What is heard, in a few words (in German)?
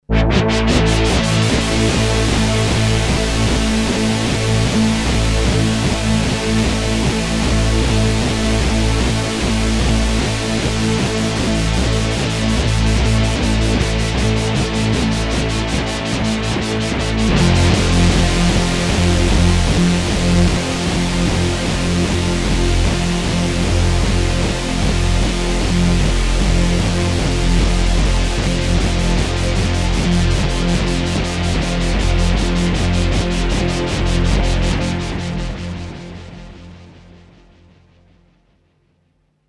Unison und LFO